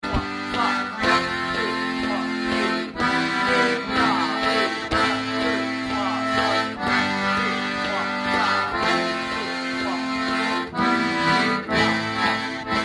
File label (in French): ACCOMPT ACCORDEON